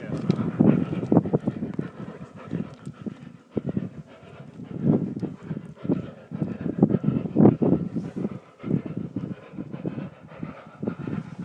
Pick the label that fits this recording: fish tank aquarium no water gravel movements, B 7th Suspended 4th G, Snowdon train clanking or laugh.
Snowdon train clanking